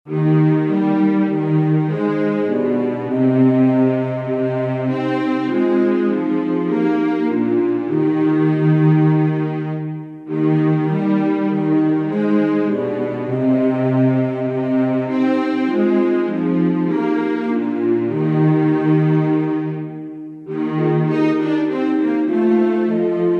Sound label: Instrumental; Bach; St John Passion